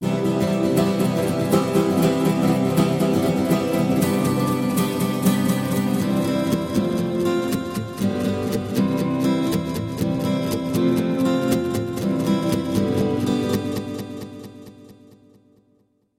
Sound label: Echo